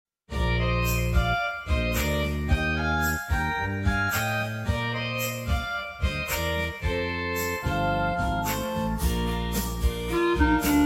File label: clarinet slow